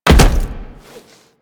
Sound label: Land Heavy Default, Footsteps; Warframe; Tenno